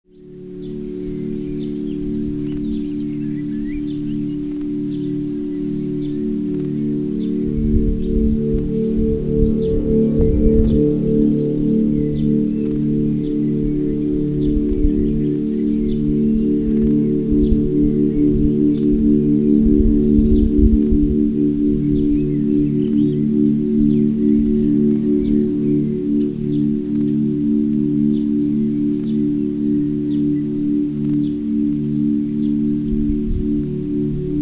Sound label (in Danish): vindharpe